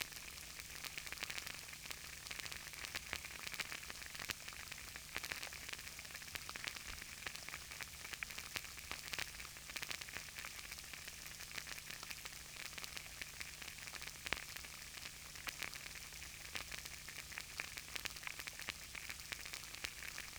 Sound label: Drizzle